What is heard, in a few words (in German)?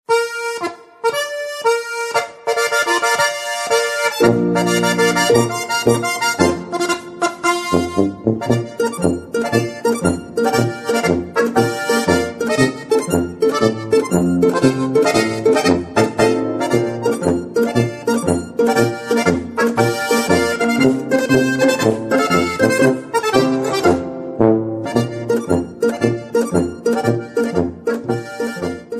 marsch